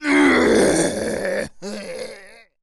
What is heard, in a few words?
zombi death, male